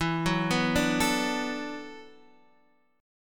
E 7th Suspended 2nd Suspended 4th, down, Guitar, Open D